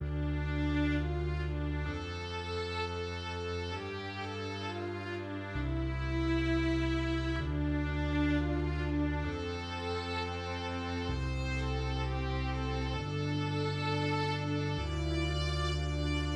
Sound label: Sad Strings theme